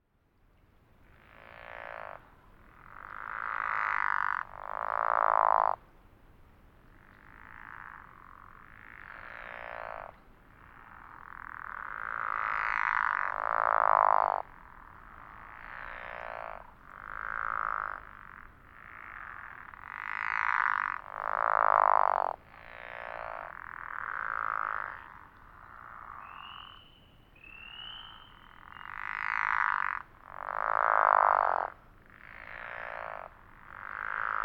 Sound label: pickerel frogs; elliott mountain